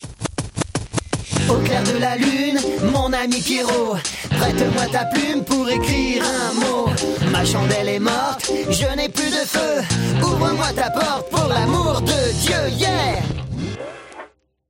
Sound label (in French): Chant Rap Clair; Comedien